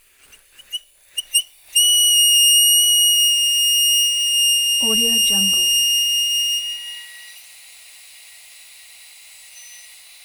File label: dynamic steam whistle